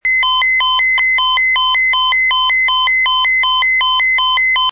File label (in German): bosch fme